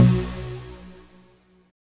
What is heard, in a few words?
NOISY Gb2, dubstep; Jack Sparrow Deep Dubstep Progressions